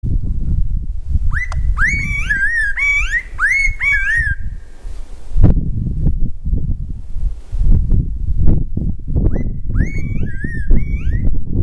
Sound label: laughingthrush spotted Garrulax ocellatus